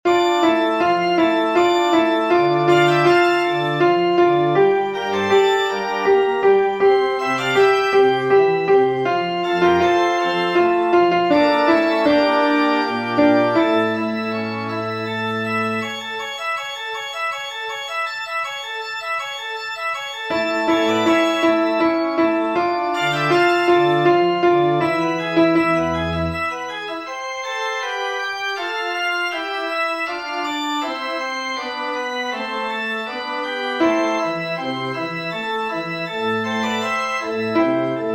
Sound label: Sanctus ALTO; Bach Caldara BWV 239 Sanctus; musescore